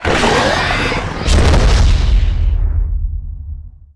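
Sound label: death, sonak; giants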